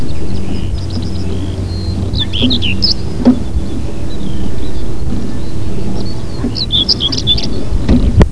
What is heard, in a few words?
bird, ptaki